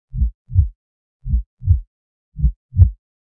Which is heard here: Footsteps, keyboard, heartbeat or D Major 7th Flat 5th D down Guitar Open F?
heartbeat